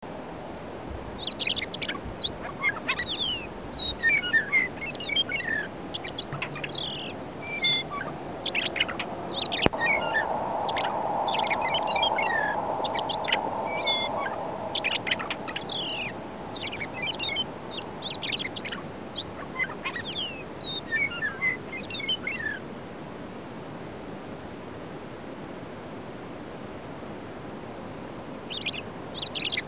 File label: Western Meadowlark